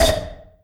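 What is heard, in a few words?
Cricket Oneshot, beats; Sonic Foundry (Sony Creative Software); Esoterik Beatz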